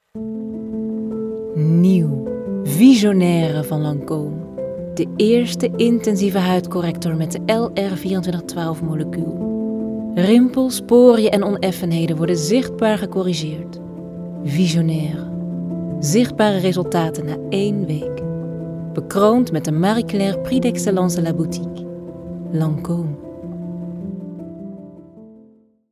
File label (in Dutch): muziek